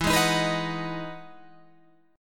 E Major 9th E down Guitar Open G

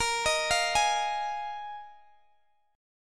A sharp 7th Suspended 4th A sharp, Guitar, DADGAD

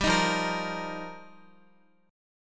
F 7th Suspended 2nd Suspended 4th, Guitar; F7sus2sus4; strummed

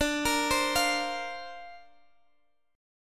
C 7th Suspended 2nd Suspended 4th D

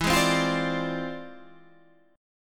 E 7th Flat 9th Sharp 5th; E7b9#5; strummed

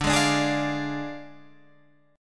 D Diminished Guitar Open G, chord

